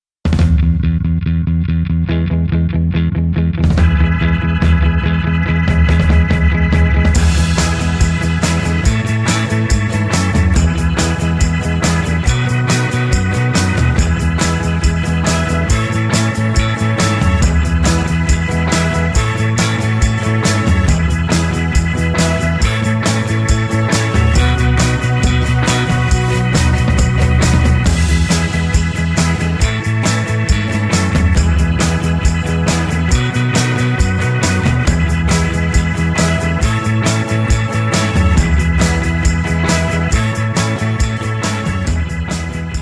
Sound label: No Backing Vocals, (E To F)